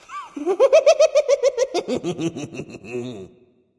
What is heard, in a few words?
laugh; smite; loki